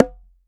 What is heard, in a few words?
CONGA L TOE; AKAI S6000 CD-ROM - Ultimate